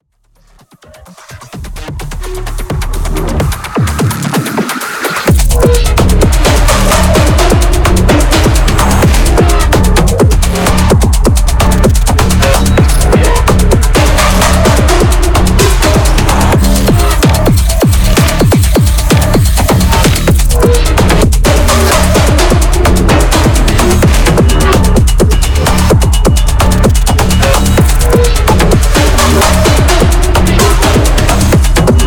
Biome 1 Teir 3 + Melody; Voidbreaker; ps5 windows xbox series xs

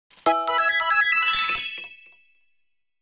Achievement Fanfare; wii fit plus

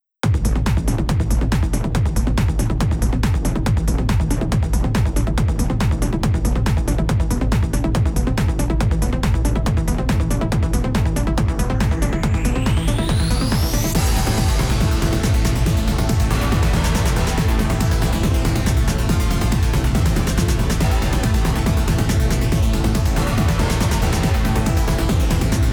Chaotic Beat; the legend of heroes kai no kiseki farewell o zemuria

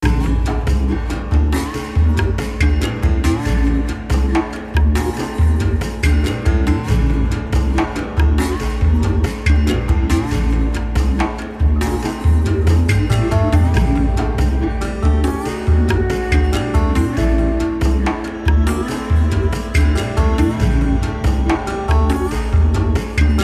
Dungeon; Ape Quest; PSP

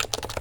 UI Comment Type

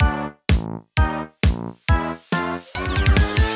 120 to 150 bpm; Rhythm Hell

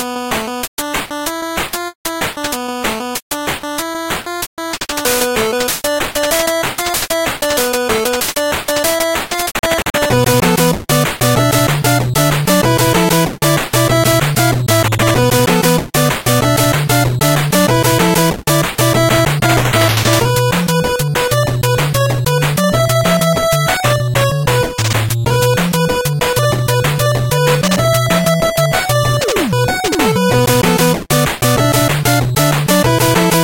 dance Robot Dance 8bit; Compass Combat Providence Analysis System; Android, iOS, mobile; Minigame~livearena~music Pack

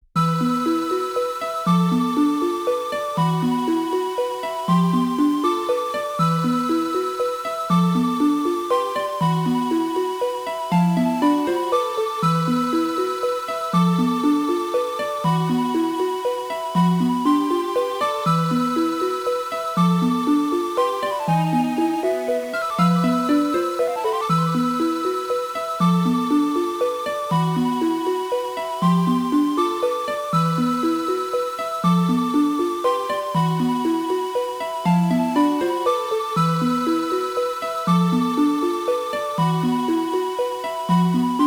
Mysterious Fountain; Shantae Advance Risky Revolution original soundtrack 2025

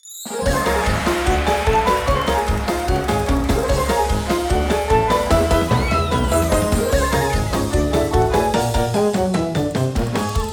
Slapstick; Shuten Order

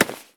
Grab

